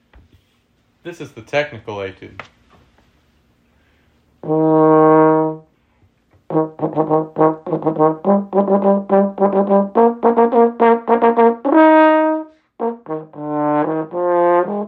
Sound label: Technical etude; madison bison band